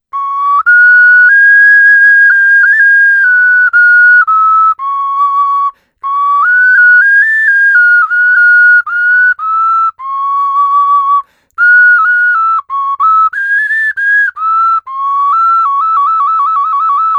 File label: PreColumbian 4 hole animal tune, ocarina